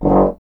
tuba; Miroslav Vitous - Solo Instruments VOL-2

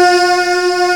MMM C4, VO&BELLS; AKAI S6000 CD-ROM - Volume 1; SAMPLE WAVE MIXING 1

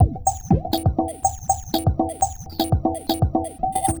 120 DISCO; USB Soundscan vol.20; Fresh Disco House I; AKAI; DISCO ADD

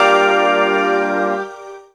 synth g, Techno Chords

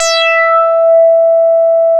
E6, Bass; CRANDLE; E-MU Producer Series Vol. 6 – Analogia Project (CD 1); Boom da Bass 1

